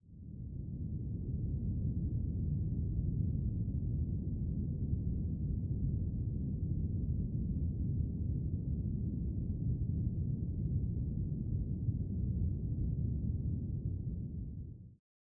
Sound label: distant plane; noisepalette; sirenfx